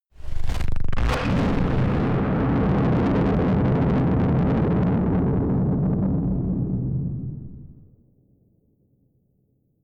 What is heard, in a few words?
distorted explosions